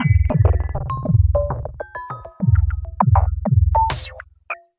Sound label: easy listening on mars, drum loops and breaks, 100 bpm; 091 to 100 bpm